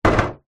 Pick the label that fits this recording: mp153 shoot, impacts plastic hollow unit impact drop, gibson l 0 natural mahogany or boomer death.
impacts plastic hollow unit impact drop